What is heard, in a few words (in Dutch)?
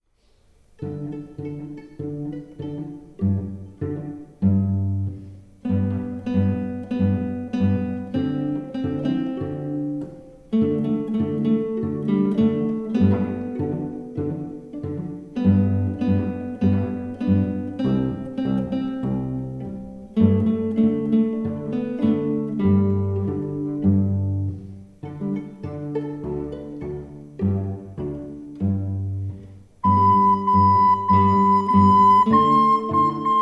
Band, applaus